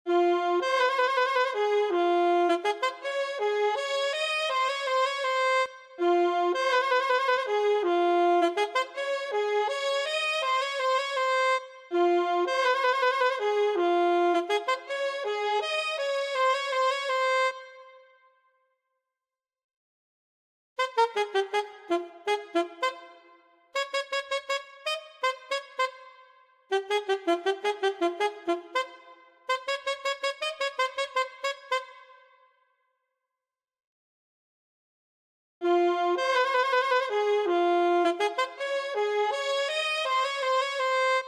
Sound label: Saxophone alto